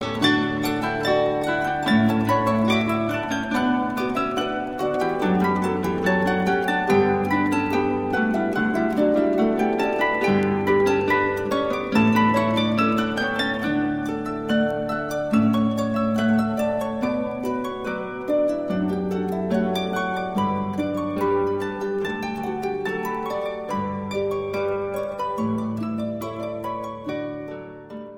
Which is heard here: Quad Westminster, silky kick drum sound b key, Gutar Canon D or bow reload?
Gutar Canon D